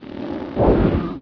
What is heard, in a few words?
attack muffled single, antlion; npc; source engine; episodic